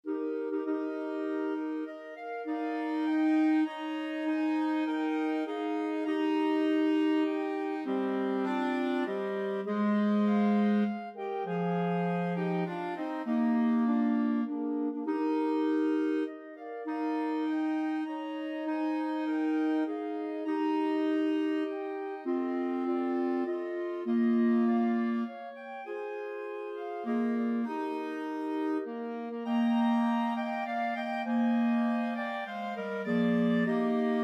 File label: 5 clarinet, clarinet choir; mendelssohn